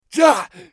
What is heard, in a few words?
bear wound; eft